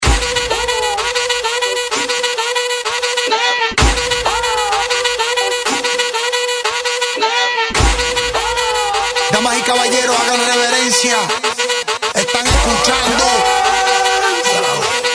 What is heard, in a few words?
Reggaeton